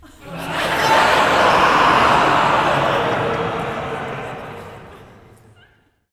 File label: LAUGH CHOIR